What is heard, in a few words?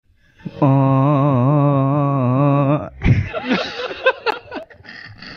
ooohhh hahahahaha